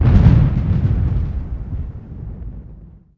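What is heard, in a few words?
thunder far; The Elder Scrolls III - Morrowind; Morrowind GOTY GOG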